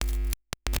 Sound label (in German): KNISTER; Xsample VOL-9; NOISE